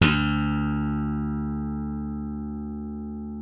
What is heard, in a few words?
D2, slap thumb; Xsample VOL-1